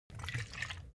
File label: object bottle vodka upend; smartsoundfx